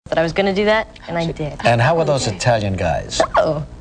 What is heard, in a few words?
squeal